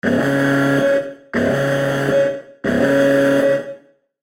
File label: ALARM; LunarInfantry; Bremsengine